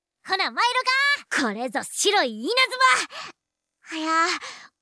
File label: Vocals